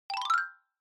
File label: Xylophone Fast Short Up